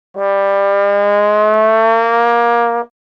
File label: trombone glissando